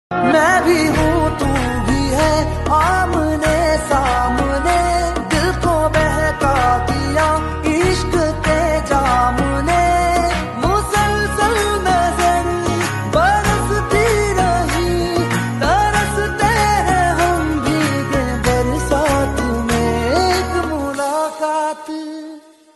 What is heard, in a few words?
bollywood ringtone